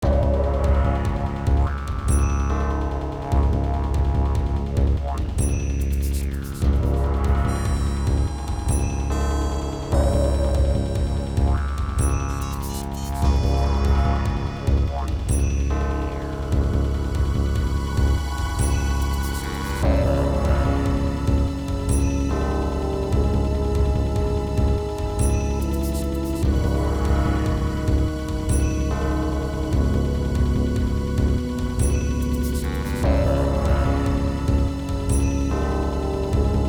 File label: Mission Failed; Golgo 13; Namco System 12; arcade